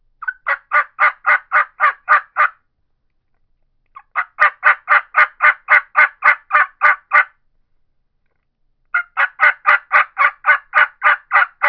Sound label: rio grande special mouth call yelps, turkey; Southland